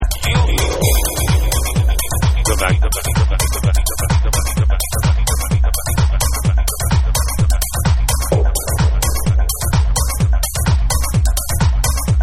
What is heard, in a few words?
MIDI